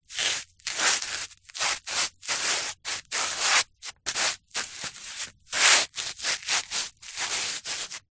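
foley feet flip flops movements steps random dirty concrete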